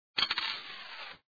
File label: coin